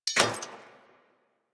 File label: Arch Burst Gun Mech, Weapons; DE; Warframe; Archwing